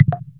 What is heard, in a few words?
ui error; Motorola A910